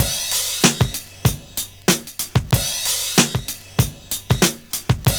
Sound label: PHASE CRASH, Kitch 70s; Univers Sons